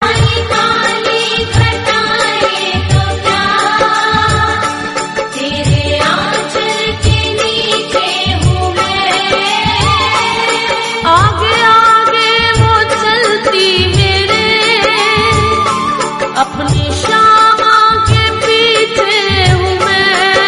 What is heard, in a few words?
devotional hindi song